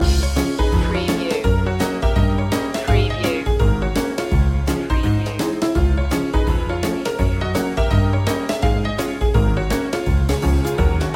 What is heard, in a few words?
ragtime promenade loop